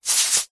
human bare feet skin rub together

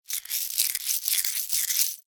industrial small screws movement in hand

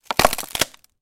impact thin wood snapping

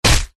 human punch smack crunch; zapsplat

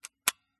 Smena Symbol camera wind lever locked; dpa4060; PMSFX

